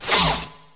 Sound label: G#4 ZAP; RED VOLTAGE